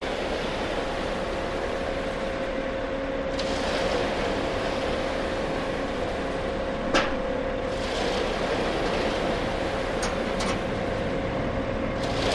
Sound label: Beton Mixer Truck; USA; Orange County